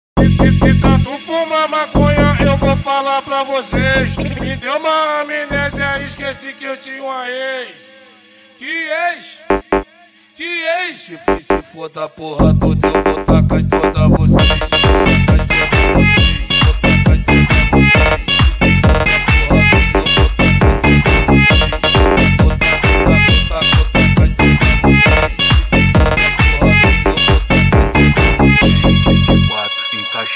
mega funk

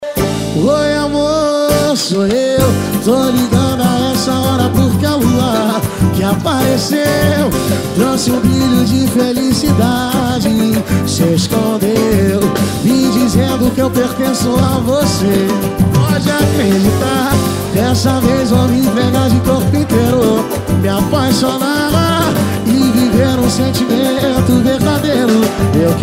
pagode e sertanejo; xtreme sound

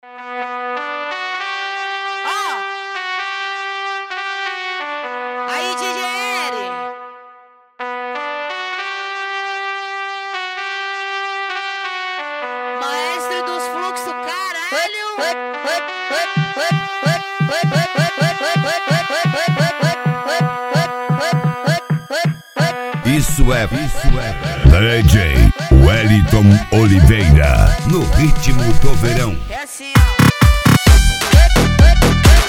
funk tumdum e mega funk

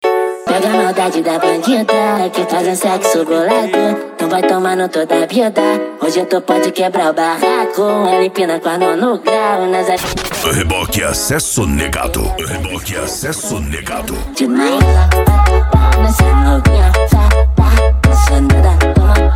racha eletronica e funk mega bass

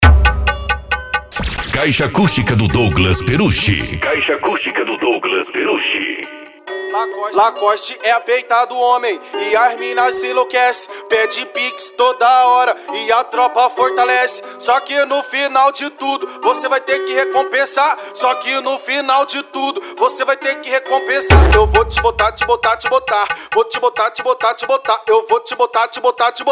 especial funk mega bass

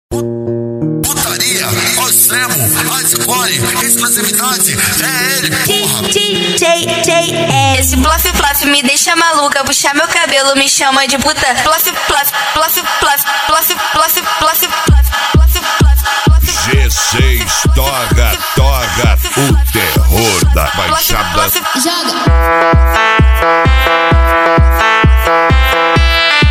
automotivo; plof plof; cd g6 torra torra o terror da baixada

